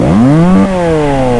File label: chainsaw